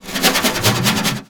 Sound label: Snare Drum; Percussion Samples